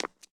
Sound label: concrete step; Golden Crayon Sounds